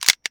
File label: M92F slin; ガスガン「M92F」の音